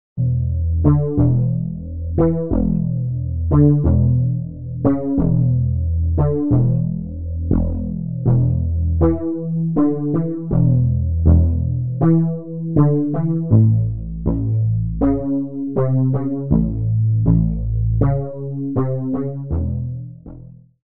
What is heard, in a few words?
bass chorus bass wet